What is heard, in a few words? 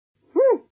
huh; Homer Simp-Flingston